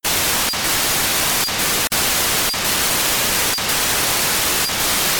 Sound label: 9k6 GMSK (FM), 5x beacon; TY-4 (CHANGSHAGAOXIN); 435,925; NanoCom AX100